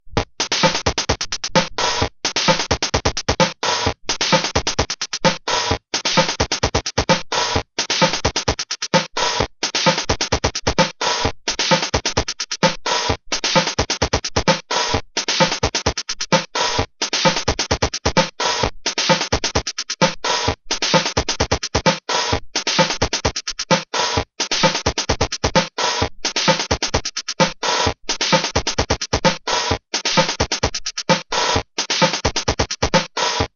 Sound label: Drumkit sample